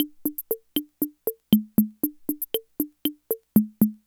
Loop, classic machines, rhythm77

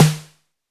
Snare; Noise Engineering Basimilus Iteritas

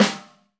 MED, 30S JAZZ; Northstar - New Gold VOL-1

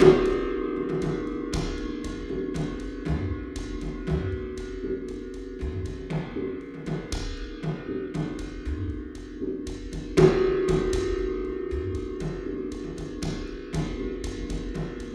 Quantum, Processed Percussion

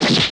FIST HIT; Total Control - Action Cutz

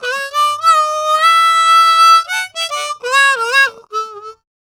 Harm 098bpm A, Harmonica

